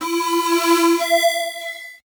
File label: Flash Beep Long; Multimedia Internet CD-Rom Flash